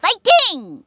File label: Seed Fighting